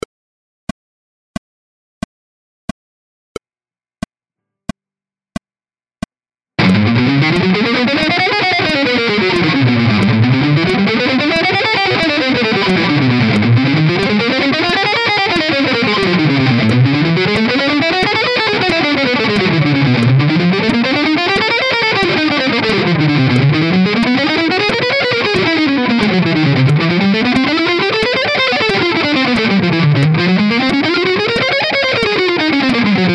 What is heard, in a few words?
scales rhythmic variation